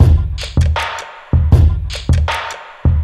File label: 106 bpm 00s drum loop E key, drum groove - kick tuned to the E note. Loudest frequency: 539Hz